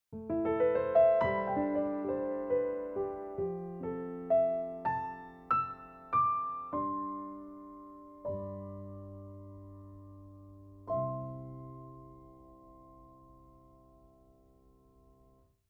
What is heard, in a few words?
piano dry